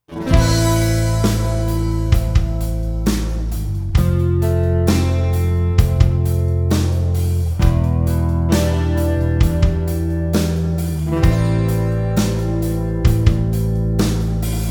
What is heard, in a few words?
guitar